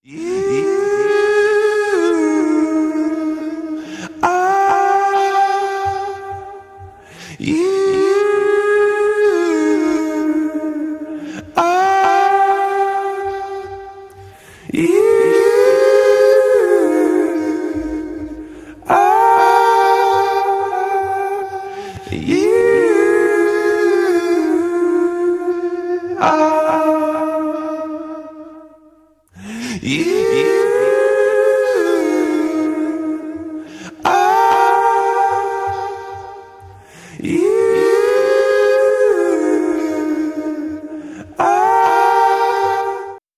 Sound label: voice, Sad